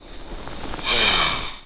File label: Snake In Walk; Seer; GamigoNA